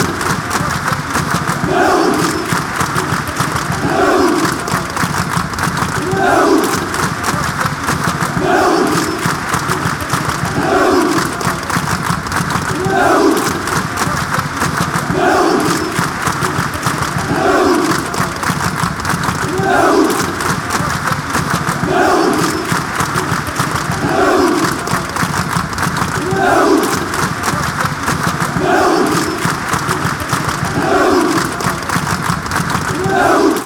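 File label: clap clap, football songs and soccer chants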